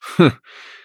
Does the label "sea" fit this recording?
No